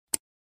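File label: slot click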